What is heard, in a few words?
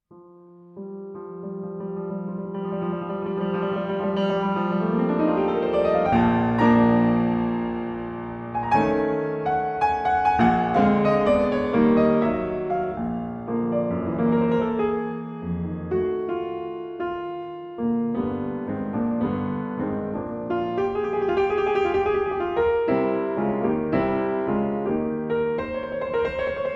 Version For Solo Piano